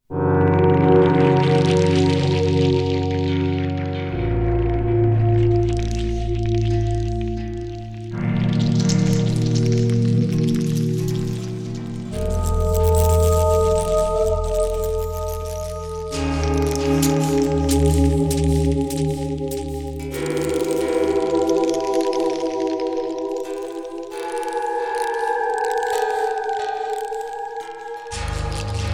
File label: Sequential Prophet X Haunted Temple